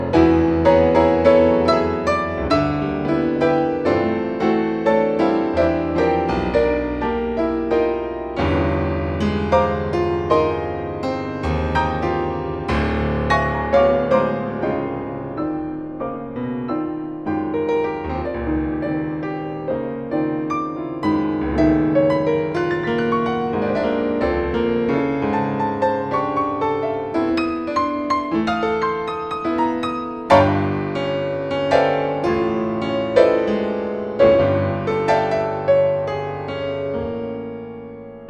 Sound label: Ballad